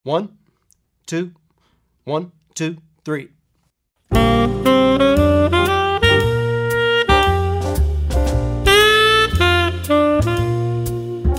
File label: jazz tenor sax, with tenor sax soloist; effective etudes for jazz tenor sax